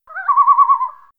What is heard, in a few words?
loon call